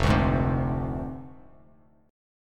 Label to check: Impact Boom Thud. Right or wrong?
Wrong